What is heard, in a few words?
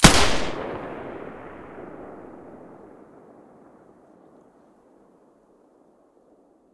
silencer g36 stereo, weapons